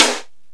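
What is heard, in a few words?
sharp snare; East West; Global Sounds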